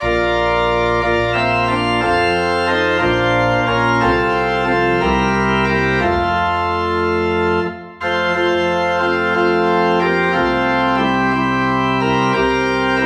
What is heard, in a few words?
Organ in F